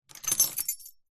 door keys small bunch plastic fob pick up from table; zapsplat; foley